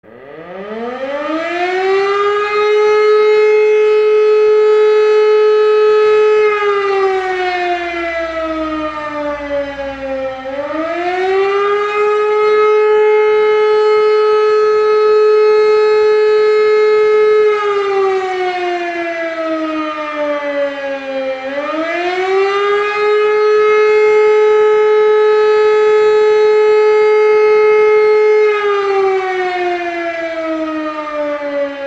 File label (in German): Warnung der Bevölkerung